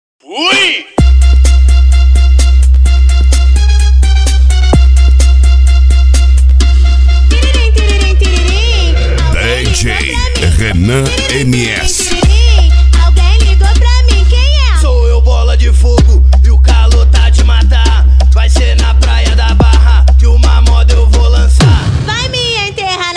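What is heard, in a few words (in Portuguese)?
alto falantes loudspeakers 5250 w agressive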